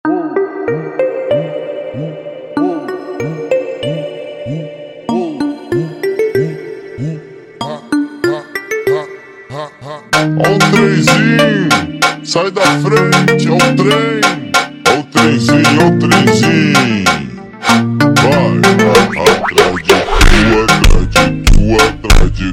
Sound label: Funk super Slowed